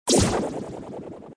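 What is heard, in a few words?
hit and spin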